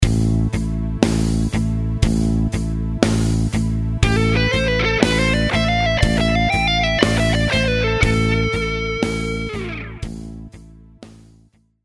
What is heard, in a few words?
Lick 60bpm